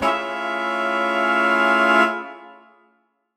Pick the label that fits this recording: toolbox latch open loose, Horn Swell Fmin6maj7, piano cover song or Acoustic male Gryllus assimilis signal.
Horn Swell Fmin6maj7